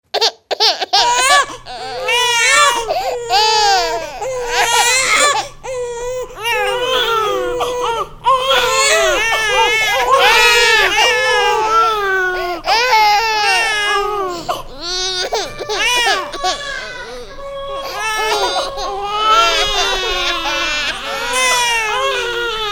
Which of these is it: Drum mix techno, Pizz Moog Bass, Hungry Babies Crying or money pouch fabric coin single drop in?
Hungry Babies Crying